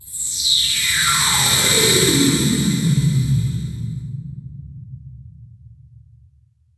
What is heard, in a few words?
Downlifter